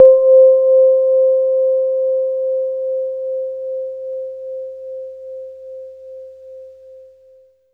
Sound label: RHODES; East West - Phat & Phunky